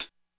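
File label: Hihat Closed, Korg DSS-1 Drums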